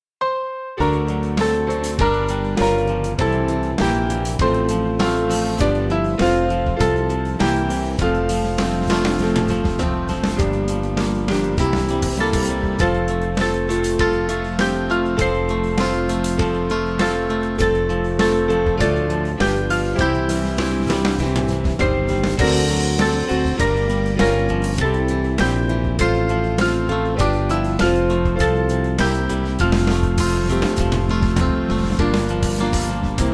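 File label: Band, Music Backing Files for Churches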